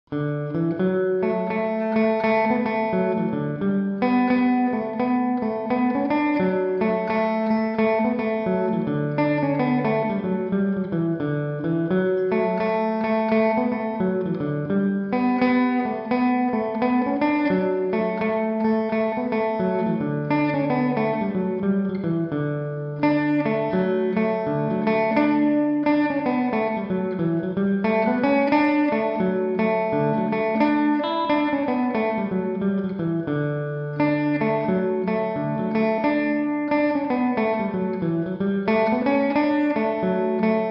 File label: kilfenora jig dmaj tenorcaster, mandolin